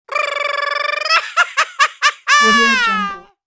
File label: happy mexican mariachi shout